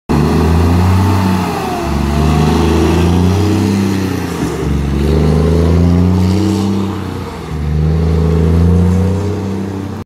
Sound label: Volvo fh